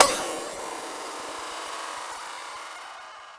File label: SD, 150 BPM, Drum & Bass; Ueberschall; Drum & Bass Resonance